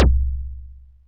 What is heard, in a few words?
bd soft; percussion